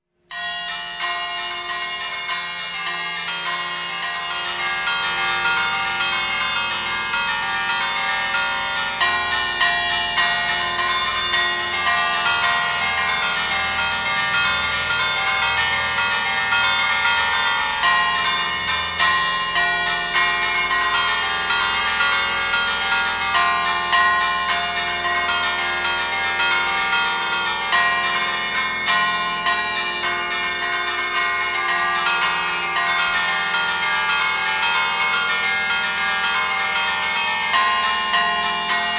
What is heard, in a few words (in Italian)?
campane, polca per cinque campane, chiesa; chiesa di Grumello de' Zanchi; Giulio Donadoni